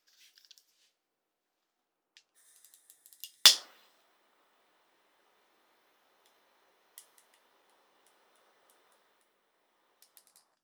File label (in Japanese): ライターで火を点ける